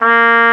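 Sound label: Trumpet mf A#2; Multisamples; Digital Sound Factory Virtuoso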